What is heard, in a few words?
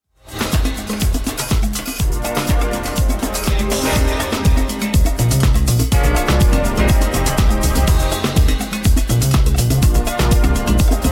drum mix